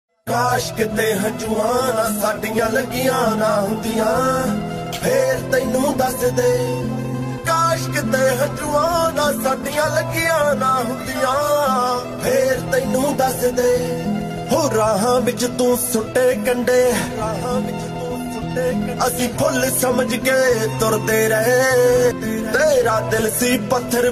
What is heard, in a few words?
Punjabi Song Ringtone